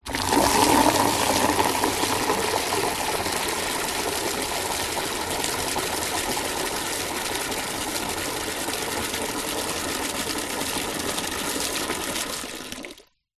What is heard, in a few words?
household plastic bucket fill water outside tap; zapsplat